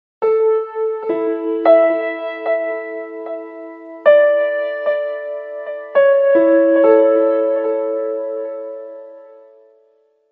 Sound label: nokia 8800 alert piano 5 ringtone